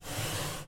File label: household wooden knife block slide kitchen worktop